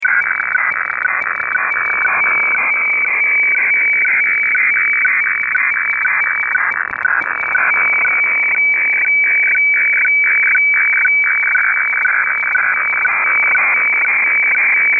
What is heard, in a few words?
Fax signal